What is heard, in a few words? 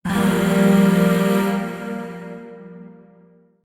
Shawzin Phrygian Chord J; Warframe; Zariman; Void Shawzin; Chords